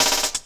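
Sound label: LOOP snare drum, Drum & Bass, 130 BPM; Ueberschall; Drum & Bass Resonance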